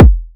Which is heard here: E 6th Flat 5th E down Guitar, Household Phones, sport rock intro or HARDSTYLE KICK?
HARDSTYLE KICK